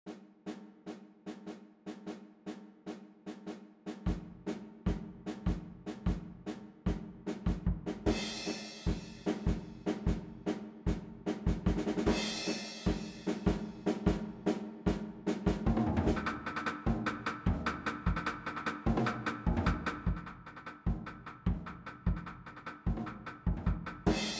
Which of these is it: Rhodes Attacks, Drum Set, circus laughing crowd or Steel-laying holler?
Drum Set